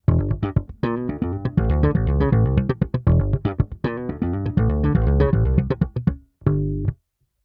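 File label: Slap DI, bass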